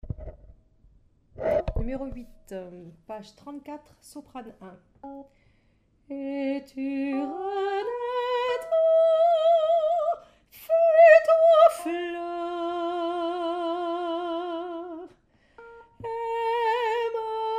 Soprano; pop

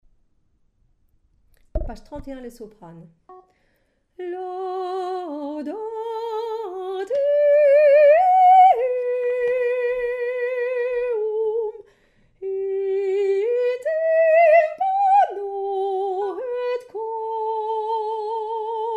psaume Soprano

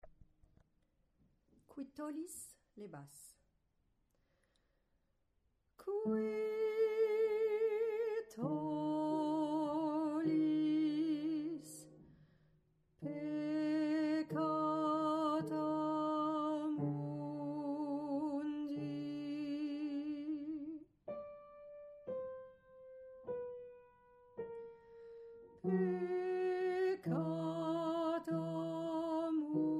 Basse

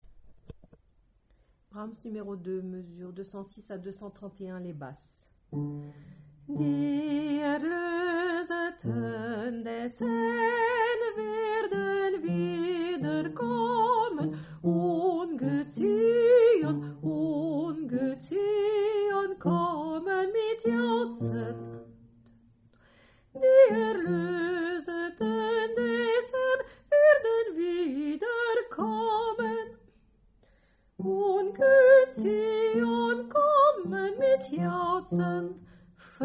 Basse

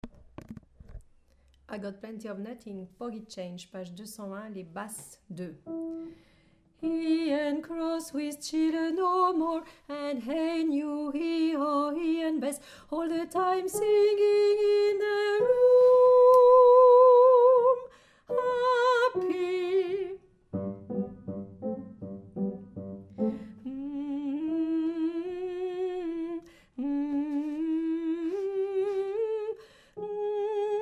Basse

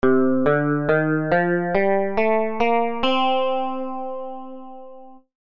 mode do dorien

